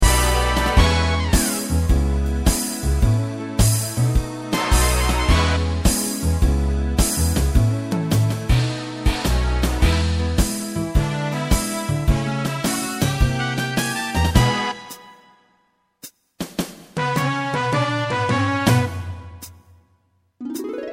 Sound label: hit me with a high note, backingtracks